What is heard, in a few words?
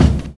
60 S BEAT; Univers Sons - Kitch 70s